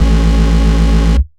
Bass Root A

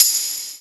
Open Hihats, Cymbals; Vengeance Samples

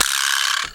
prc, percussion mix; house; PS-28 Vocal House Samples; Drum & Perc

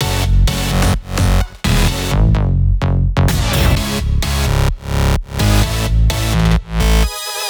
128BPM Synths Root F#; Sunday; Vengeance Samples; Sunday Kit

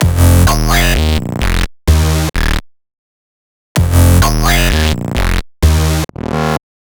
128BPM Cuts; Verve; Vengeance Samples; Verve Kit

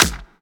Claps

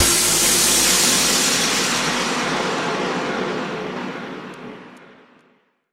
Downlifter

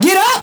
Vocal Oneshots; Vengeance Samples; Vocals

